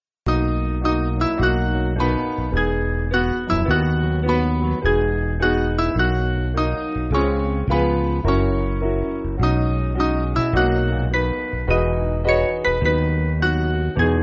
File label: Piano